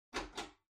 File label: Ceiling Light (Far Pull)